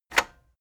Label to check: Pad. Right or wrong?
Wrong